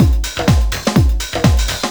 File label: belladonna, Deep House 125bpm